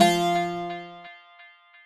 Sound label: baglama; baghlama